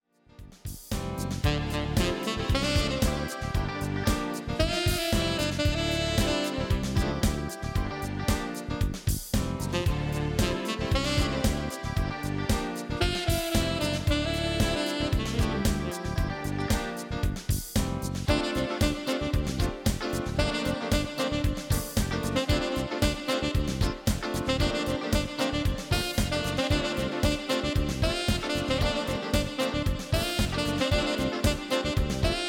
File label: Sax